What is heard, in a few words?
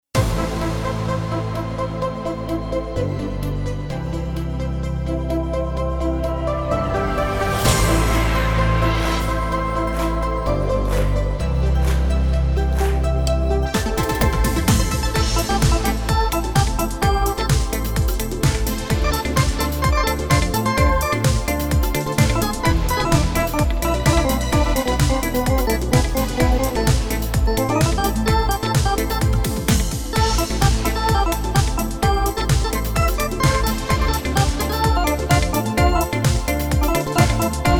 Wersi